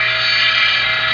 sawblade; outlaws

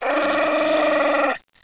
Fountain Dog Growls

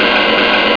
Death Hit; Catacombs

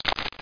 turn

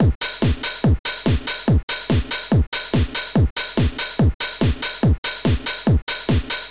dance 143 bpm; octabeats

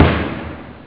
nature, snare; OctaMED SoundStudio V1

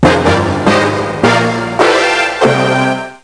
win music; JP Boxing

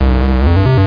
WOOBLE; robots

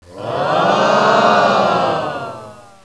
OHHH; Kunsthaus Tacheles

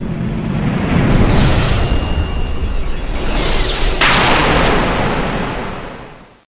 Warp

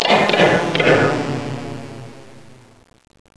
penalty, Pinball Demo Music; 3D Ultra Pinball Demo

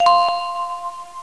No Error Sound; JChecker

